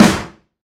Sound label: tight steel snare drum sample g key; snare drum samples